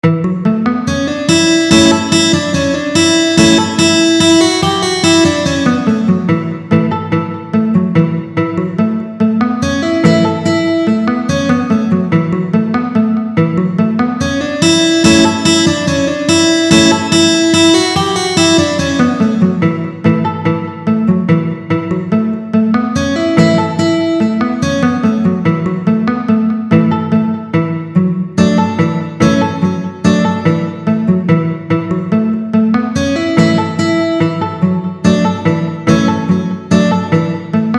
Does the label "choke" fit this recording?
No